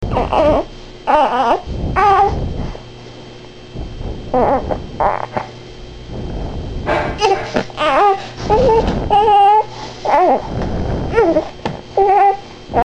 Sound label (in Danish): knirker